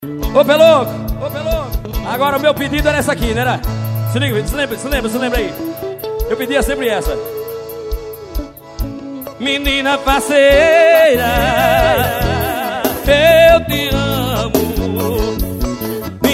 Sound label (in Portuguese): forro, festival do jegue